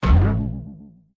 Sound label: Target trampoline